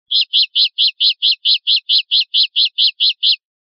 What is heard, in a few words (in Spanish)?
rep rabieta achillonada, pardillo